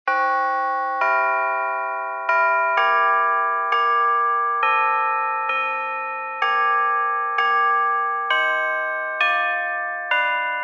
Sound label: Eternal Father English Bells